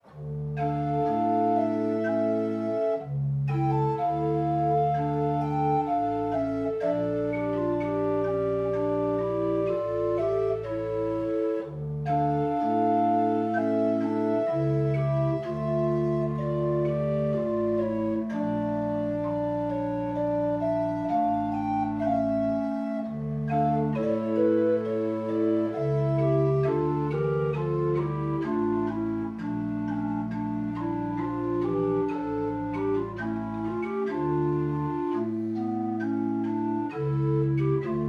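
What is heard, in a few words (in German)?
Schulte, pfeifenorgeln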